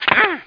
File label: BM JUMP; CD Action; outlaw